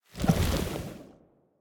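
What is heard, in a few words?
movement armor; smite; cthulhu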